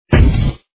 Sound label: anvil